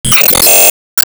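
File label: Battle Card Get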